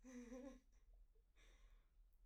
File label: laughter; IEEE AASP